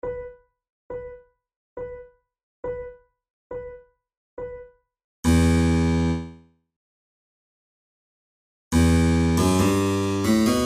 flute sonata in b minor adagio; handel

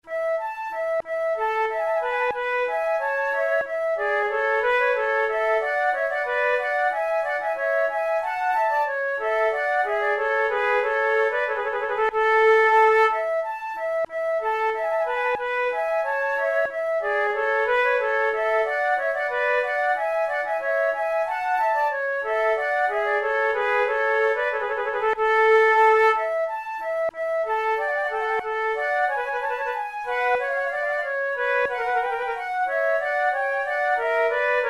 allemande, flute

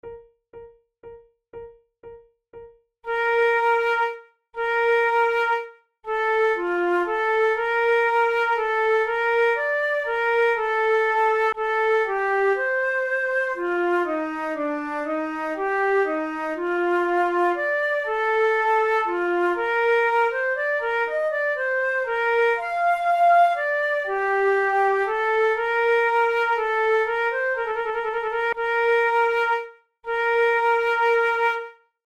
prelude, flute

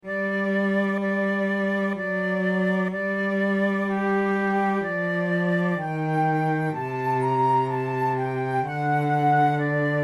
recorder sonata in G minor adagio; Marcello

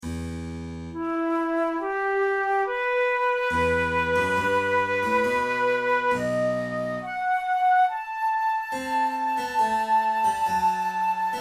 flute sonata in b minor adagio; handel

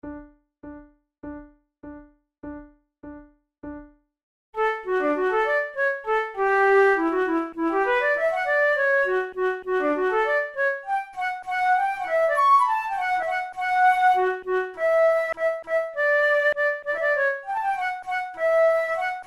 duet, flute

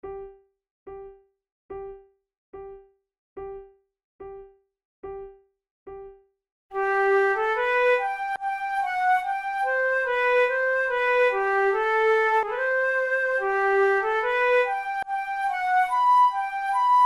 duet

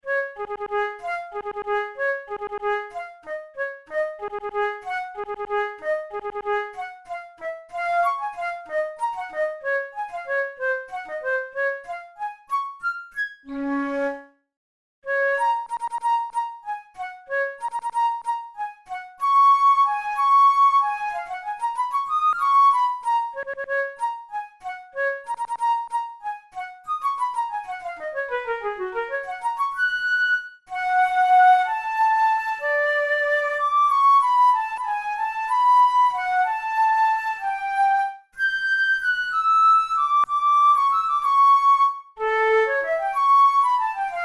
studies for the boehm flute; drouet 72

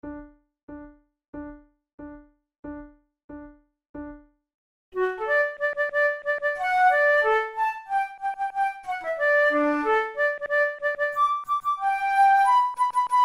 duet, flute; weber

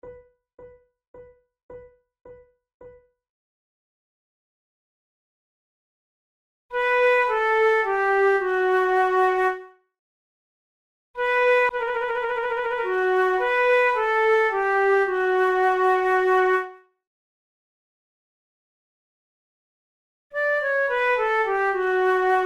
two flutes; Monteclair; 6 concerts; le papillon; flute tunes

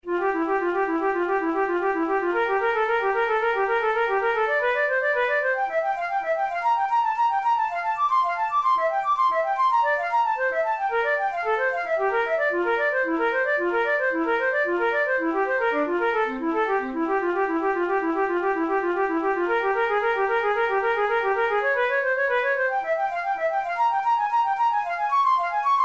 caprice etudes, flute

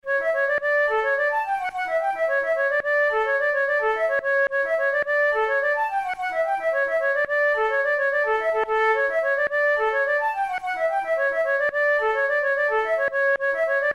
hunt, flute; campbell

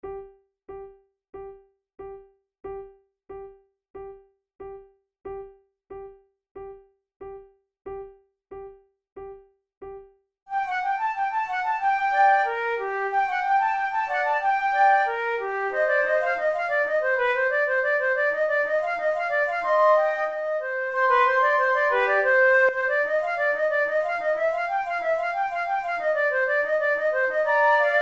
flute sonata prelude; mattheson

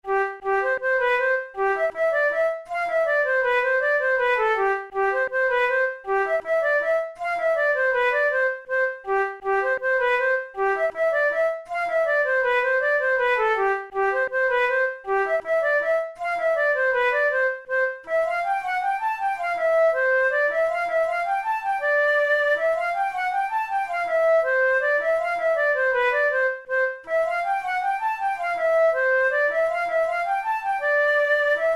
mazurka, flute; auvergne

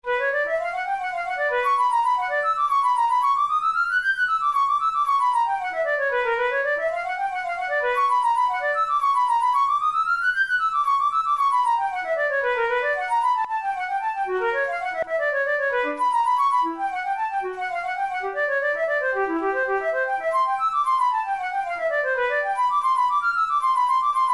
etudes, flute

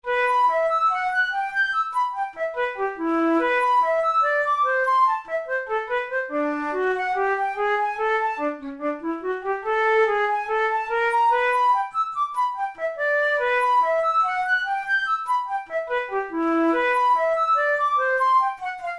study in e minor, flute; kohler op33 no1 study no6

